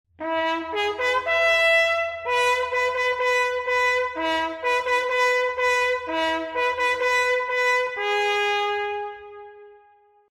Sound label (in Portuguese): Toque Silencio